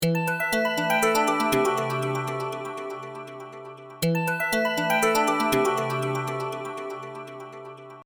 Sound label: iPhone Ringtone